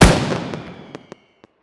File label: Balloon Pop; SteamVR; InteractionSystem